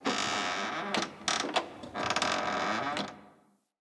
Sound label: Station Misc, Ambience; Soul Plane; Orlick